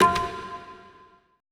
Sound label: TUNEDRM F1 R; BPC DRUMS